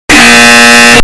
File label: buzzer; lie; silent